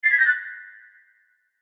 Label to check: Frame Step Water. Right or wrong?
Wrong